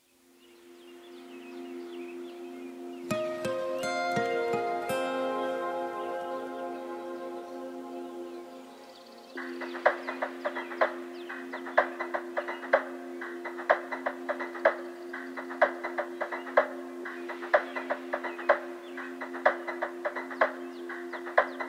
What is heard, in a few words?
live melodic house